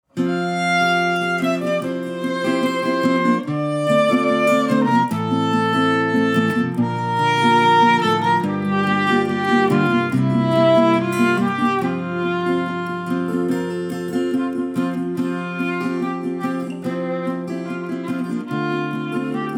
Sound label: instrumental; Auxois; Y-ai-pu-diau-dans-mon-siau